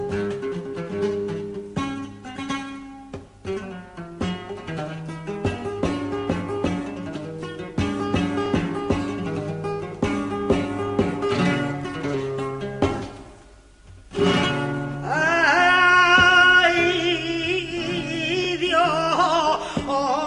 Siguiriya; del Fillo; cabal

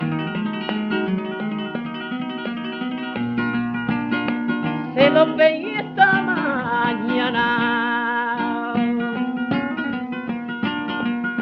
Solea, flamenco

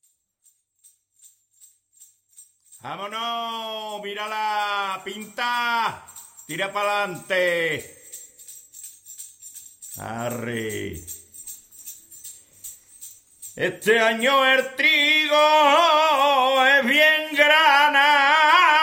Tona de trilla

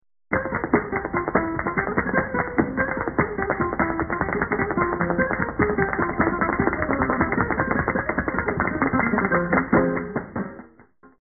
buleria, flamenco

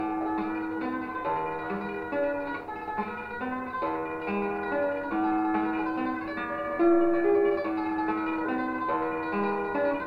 Solea, flamenco

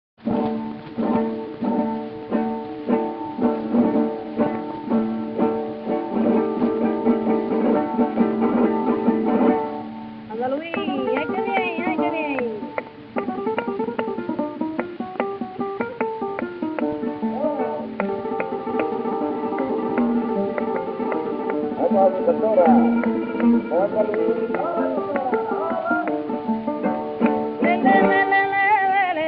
solea, flamenco; del mellizo

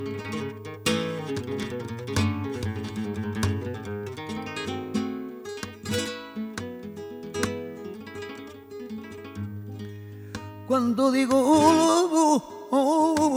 Solea, flamenco

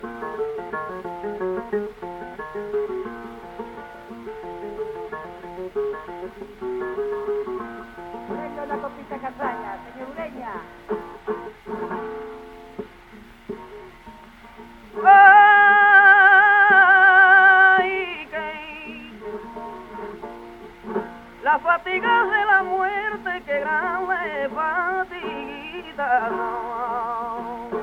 Solea, flamenco; de Ribalta

